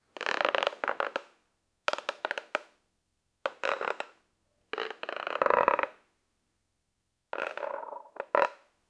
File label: moving scratching sound of; sound effect